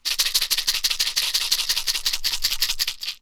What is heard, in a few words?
box rattle; Kenya Box Rattle